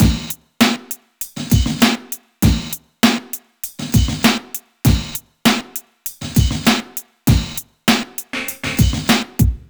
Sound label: beat